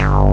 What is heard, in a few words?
PULSE Q BS, TECHNO; Pro-Rec - Synthsonic; Z1 TECHNO